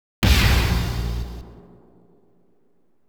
New Challanger; king of fighters n.e.s.t.s. dream sound legacy

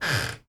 wood creak echo

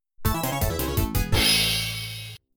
Multiball End; Cactus Jack's; Gottlieb; pinball; arcade

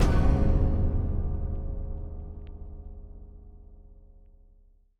Pipes Death; Very Little Nightmares

